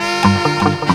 BRASS HIT; Ragga & Dub Reggae; BRASS KIT; AKAI; USB Soundscan vol.27

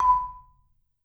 MMED F B4, Marimba; MRMBA SPLIT

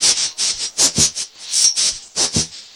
CANNED AIR; Rarefaction; Poke in The Ear With A Sharp Stick

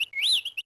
Bird

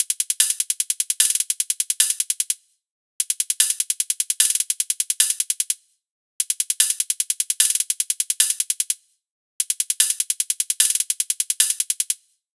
loop hat 150; Seismic Loops

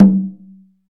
percussion congas

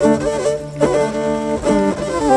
World Lick

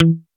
MUTED GTR, Funky GtrLicks; E-MU Formula 4000 Series Vol. 1; Hip Hop Nation

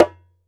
ASHIKO, African Perc

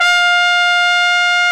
BRS AKG TP, Trumpet, Jaz; Roland L-CDX-03

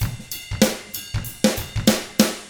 95 FUNK, Drums; ILIO; Double Platinum Drums 1

